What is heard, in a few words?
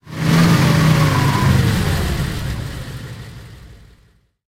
m18 engine load, tanks; engines; War Thunder